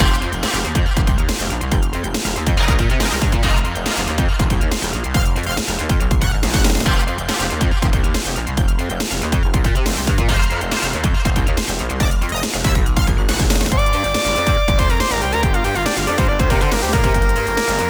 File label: Hackerman Loop - 140 bpm